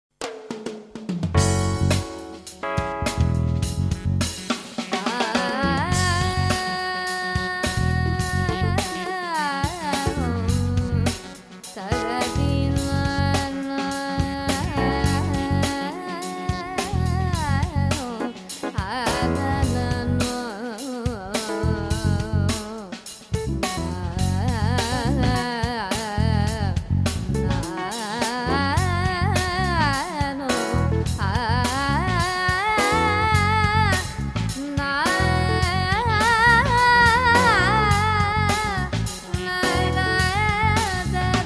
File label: Alaap Jam; morning raga 2005